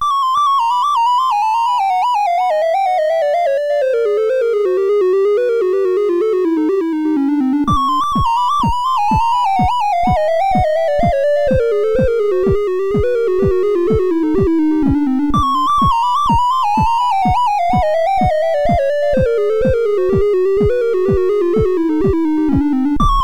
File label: C-64 sid CSG8580R5